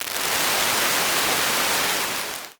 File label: applause